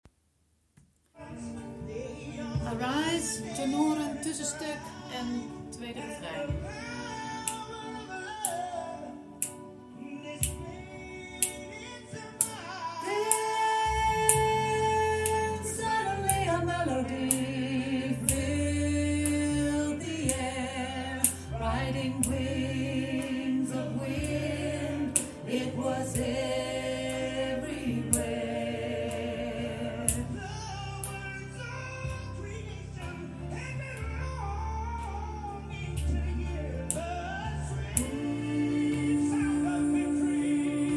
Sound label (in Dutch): Tenor Tussenstuk En 2e Refr